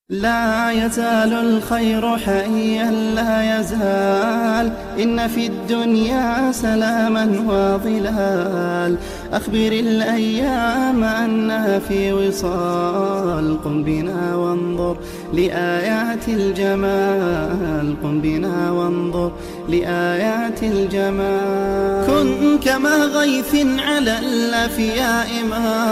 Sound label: Nasheed